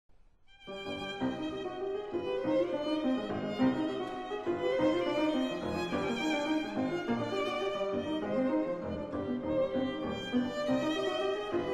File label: Piano Quartet c-moll; Brahms; No.3 Op.60